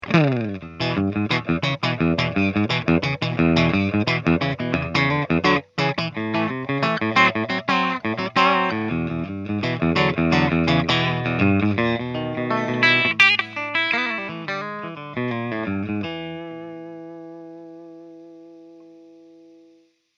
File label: sound sample country riff; thewizard